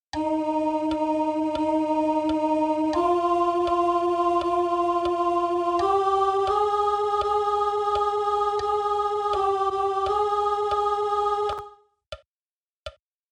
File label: Sanctus, CONT